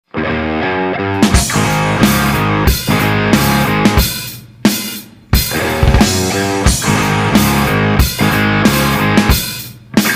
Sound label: Rock Hex